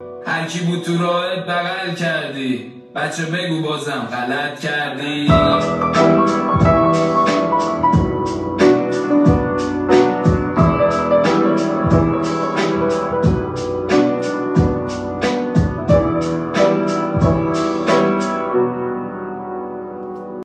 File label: Piano